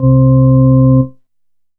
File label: E-Mu Proteus FX Cathedra C3